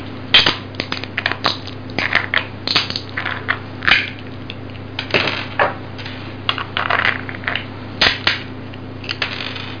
nuss

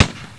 Muskete; Anno

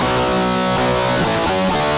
tisch

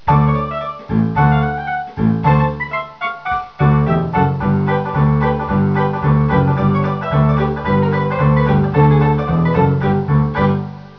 Starttitel; Skat 3.0

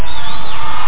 pfiffe; Aminet 21; GTI - Schatztruhe

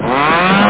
saege; Aminet 21; GTI - Schatztruhe

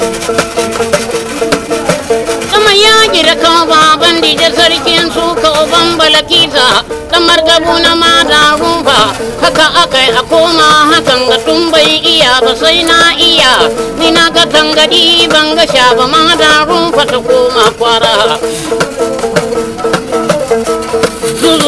MUSIK; MOBICLIC 55

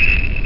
gummiton; Amiga Games 1996 #7; tippix

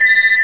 pfeife; Aminet 21; GTI - Schatztruhe; Amiga 8-bit Sampled Voice; 8,363 sample rate

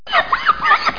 wischen

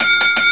Wecker

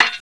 Schwert; Anno

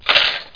besteck

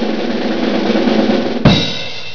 Wirbel

